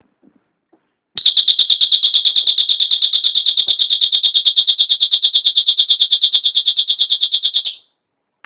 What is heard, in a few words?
12v Siren